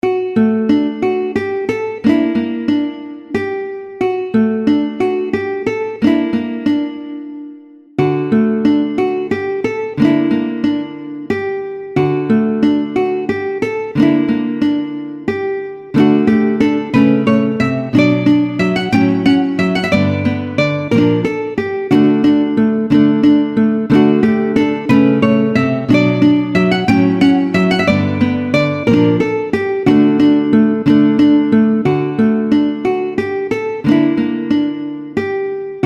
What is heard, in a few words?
guitar